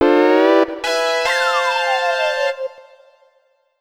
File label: (Jingle) Stage Clear; Dr. Mario Online Rx; Level 0